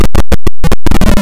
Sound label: GHOST FADE; Dangerous Dave